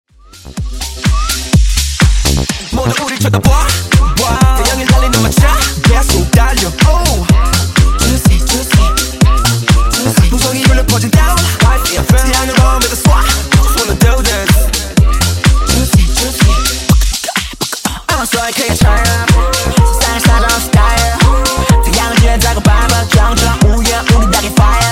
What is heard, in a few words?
Tight Subbase Dirty 125 Bpm